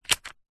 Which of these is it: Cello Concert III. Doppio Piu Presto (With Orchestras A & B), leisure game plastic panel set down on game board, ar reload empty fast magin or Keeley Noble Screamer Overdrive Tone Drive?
leisure game plastic panel set down on game board